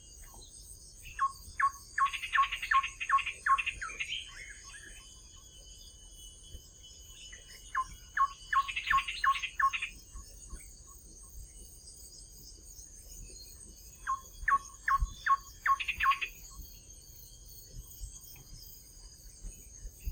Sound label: striped tit-babbler; KUCHING